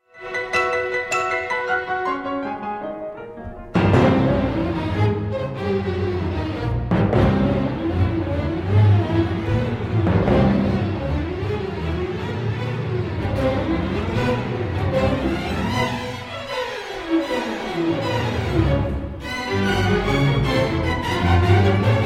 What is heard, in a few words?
music for strings percussion and; bla bartk; bbc concert orchestra; celeste